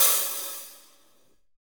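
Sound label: HH, hi-hat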